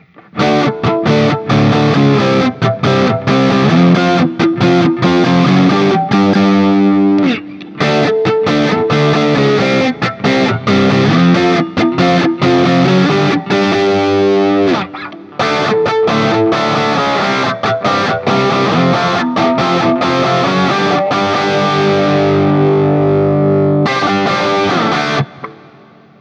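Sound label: Guild M75 JCM800 A, guitar; Aristocrat; Gold; goldtop; 2014 Guild Newark St. M75 Aristocrat in Gold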